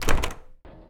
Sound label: Doors Door Lock; Counter-Strike: Global Offensive